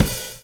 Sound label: groove; AMG Gota Yashiki Groove Activator